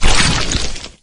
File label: carrier walk; halo; flood